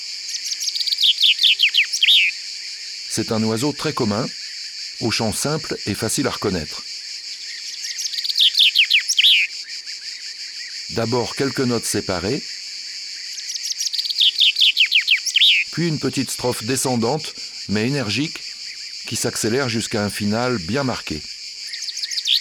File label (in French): Pinson des arbres; abc de la nature